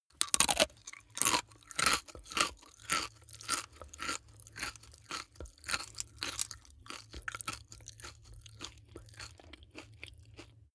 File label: eat crisp mouth open